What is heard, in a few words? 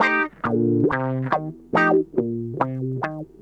GUITAR F; VST